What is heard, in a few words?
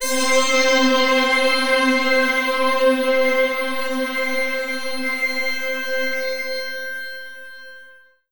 korgie, Synths; Notes